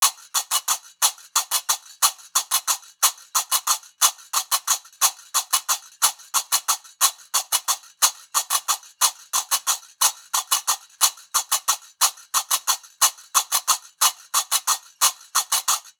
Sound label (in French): loop ternaire tempo 120; qaraqab; qraqeb; Maroc